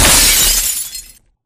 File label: glass break close; corporate; Titanfall 2